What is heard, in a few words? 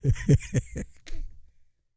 LAUGH, Vocal Jazz Fx